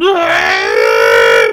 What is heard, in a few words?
zombi idle; garrysmod